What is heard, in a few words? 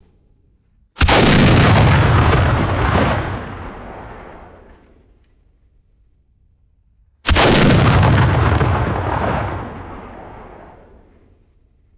massive slowed down rifle shot; guns